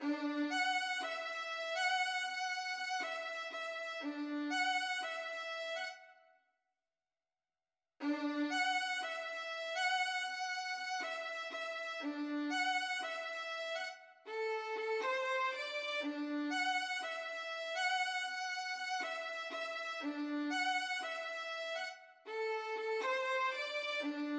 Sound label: samba violin